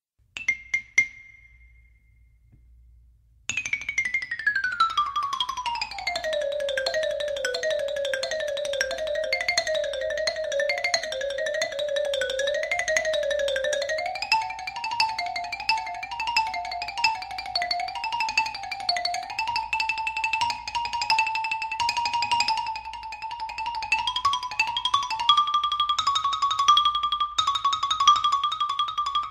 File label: Xylophone